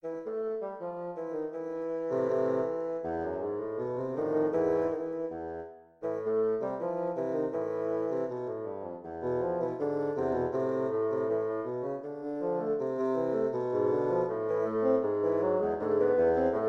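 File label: bassoon quartet; acorazado rivadavia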